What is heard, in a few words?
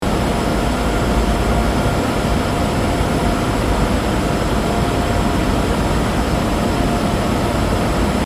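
Ambience Industrial Near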